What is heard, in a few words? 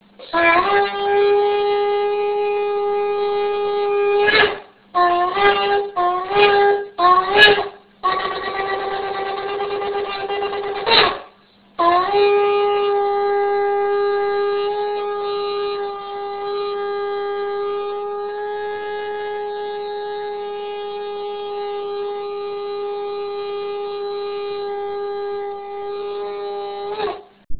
shofar